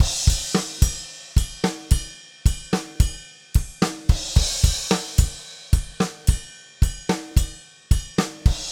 Black Maple Groove 110, DW Black Maple Kit, 110 BPM; 1999 DW Black Maple Kit; Sonic Foundry (Sony Creative Software); Vital Drums